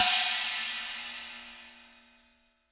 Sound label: RIDE CYMBAL